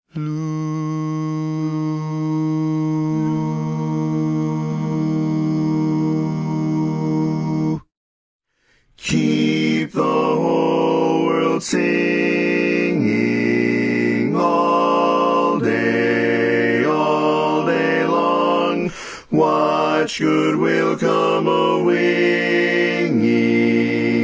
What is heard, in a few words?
Bass, Barbershop Harmony